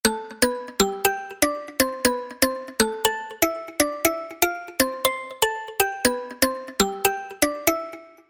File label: Thump; Itel S25 Ultra